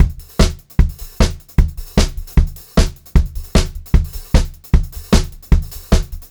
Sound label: 152 SP HH, Pop-Rock Drumloops; USB Soundscan vol.37; AKAI; 90 s Sample CDs; 152 SPEED